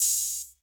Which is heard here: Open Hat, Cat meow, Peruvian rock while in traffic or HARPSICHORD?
Open Hat